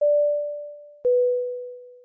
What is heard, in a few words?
Fasten Seatbelt Cabin